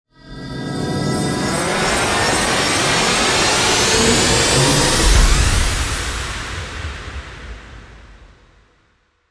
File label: transition